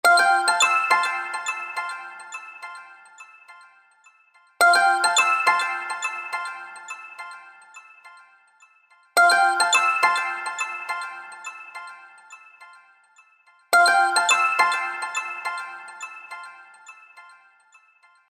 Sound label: htc innovation quietly brilliant